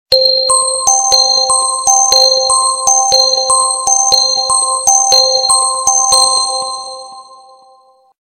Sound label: Message Bells